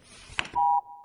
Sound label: Keycard Use; scpcb-godot